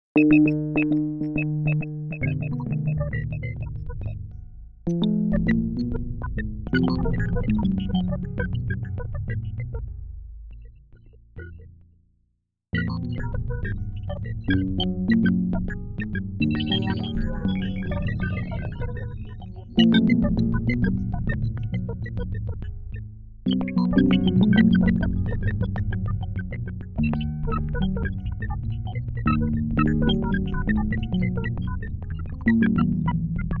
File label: Alien Tap Dance; Solo Improvisations